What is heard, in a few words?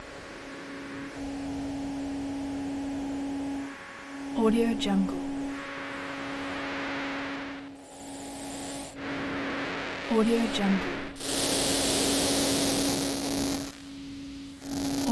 scifi galactic radio noise signal loop